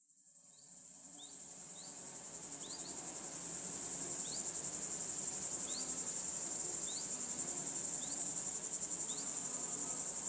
Nature Sounds - Sounds from a Field Grasshoppers, Crows and Insects; Nature Sounds - With Music; Nature Chill Out 2014